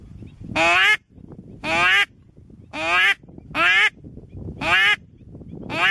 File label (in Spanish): llamada basica; reclamar a los patos; reclamos pato